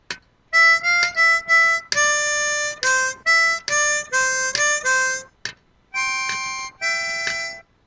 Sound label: Solo